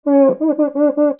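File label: percussion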